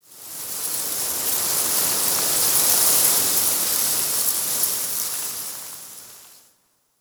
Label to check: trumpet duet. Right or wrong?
Wrong